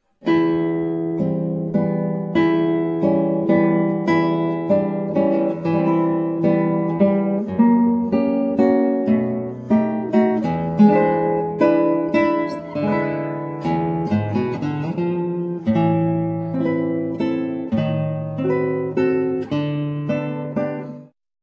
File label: VALCHORO